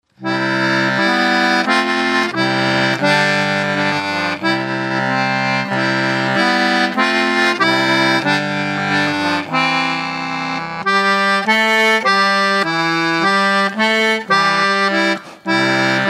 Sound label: baritone anglo